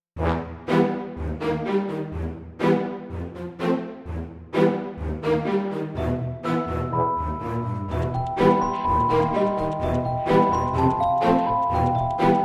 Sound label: funny horror